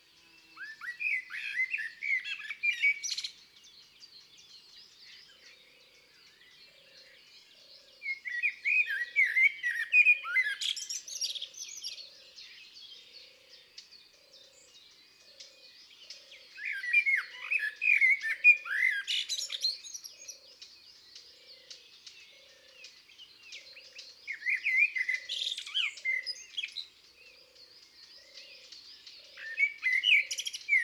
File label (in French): Merle noir, Chants d oiseaux des bois et des forets